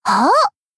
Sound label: jump; hoshino